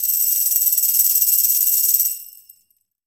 DEFI ROLL, Mediteranean Traditions, PERCS

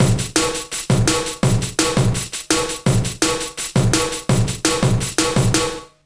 BREAK, Explosive Jungle; USB Soundscan vol.05; AKAI; 90s sample CD; 166 BREAK

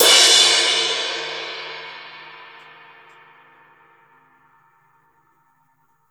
Raw Crash, Drums Acoustic; USB Soundscan vol.10; AKAI; 90s sample CD

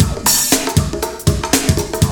BREAK; Zero-G; Man Machine

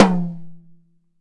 GTom, Drums; PX7; Digital Sound Factory Protean Drums

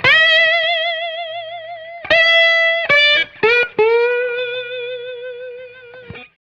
BL MEL E1, Hot Steel Blues, BLUES; ILIO

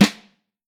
Vintage Snare; Vintage Snare Drum; 6X14; Q Up Arts; Johnny.C's Vintage Snare Drum

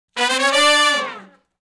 Fast Climb Up (D) unison, Horncraft for R&B; Crimson Blue and Fabulous Horncraft 4 RnB; Sections

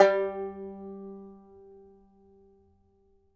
BANJO G3, BANJO NOTES; Sampleheads - Whole Lotta Country 1 & 2

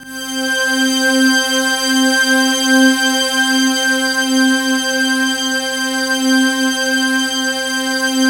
Pad3 C4, Jungle; USB Soundscan vol.05; Explosive Jungle; AKAI; 90s sample CD

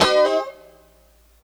D 4, Funk Chords; Guitars

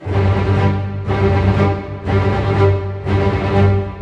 SNAR, Snare Army; East West - Scoring Tools

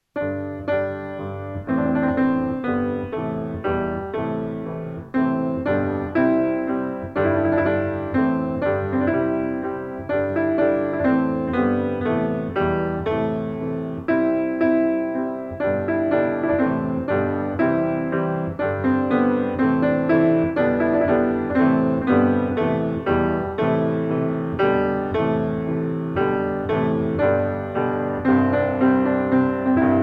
Kurd Melody

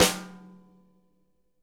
SNARE; drum

